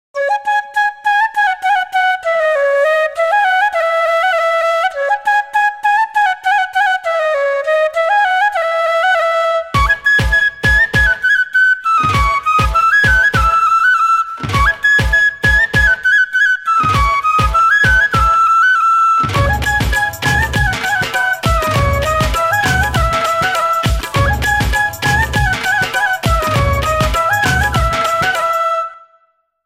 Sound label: India Bamboo; Nokia; Nokia Tones; Windows Phone apps